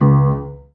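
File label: piano b octave; You Are Empty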